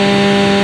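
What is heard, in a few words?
Engines low loop; SuperBike2001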